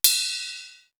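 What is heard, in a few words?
BELL RIDE, cymbal; CYMB.HH; East West; Global Sounds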